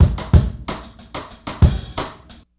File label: beat 93bpm, Hip-Hop Street Beats; Sonic Foundry; Hip-Hop Street Beats Construction Kit; Street Beats by Poogie Bell